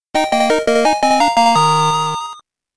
Fanfare!; Pokemon OST Collection; Pokemon Gold Silver Crystal OST

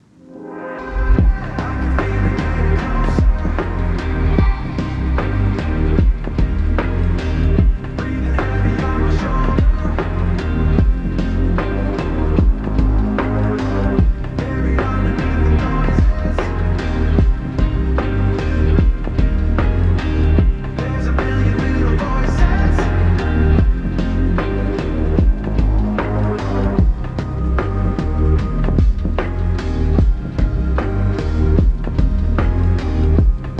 Gaming Soundscape

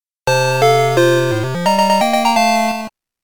Fanfare! Congratulations for Evolution; Pokemon OST Collection; Pokemon Gold Silver Crystal OST

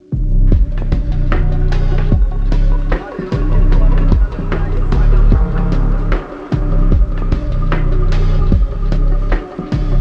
Gaming Soundscape

